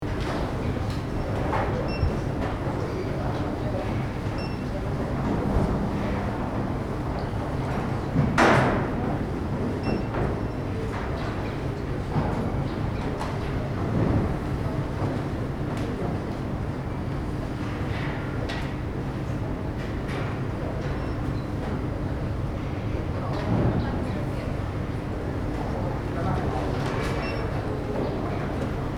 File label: Large hallway echo walla human movement loop